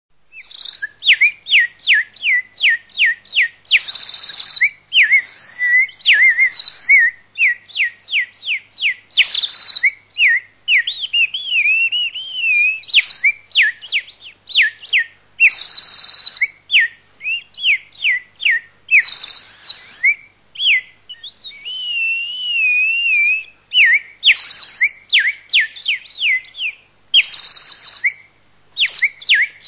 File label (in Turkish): Bulbul Kanarya Sesleri, Telefon Zil Sesi